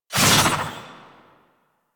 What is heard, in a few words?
Fairy Sword Swoosh; DE; Warframe